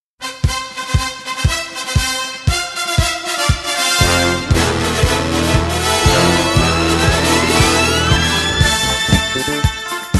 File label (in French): sonnerie; Rocky II; Motorola